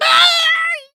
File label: scream